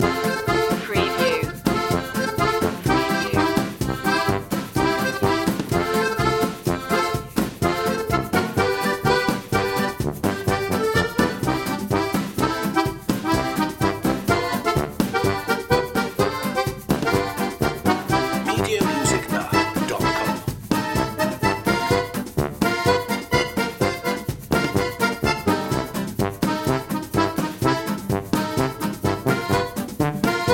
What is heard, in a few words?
cajun gumbo loop